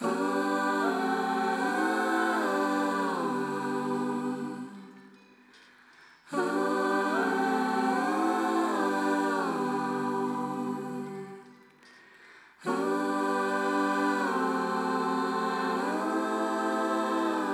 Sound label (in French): choeurs; AVE (Analog Vocal Enhancer)